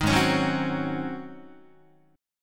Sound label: G sharp 11th G sharp, down, Guitar; G#11; strummed; 6,4,4,4,4,5